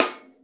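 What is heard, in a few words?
Drums Acoustic, raw kit snare drum; AKAI; 90s sample CD; USB Soundscan vol.10; RAW KIT 7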